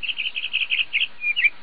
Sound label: bird yellow; minecraft; technic